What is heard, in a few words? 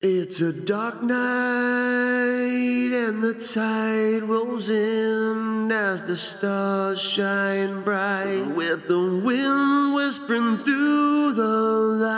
male keyboards bass